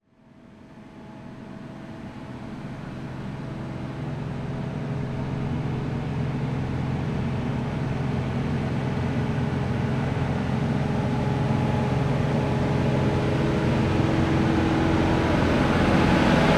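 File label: vehicle farm claas dominator combine exterior pass by fast constant speed, combine harvester; 88 SL Maxi; MONO; Kmr81i; Dramatic Cat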